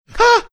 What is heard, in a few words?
aah